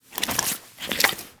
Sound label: Foley Idle Alert Lick; Enemies; Kubrow; Warframe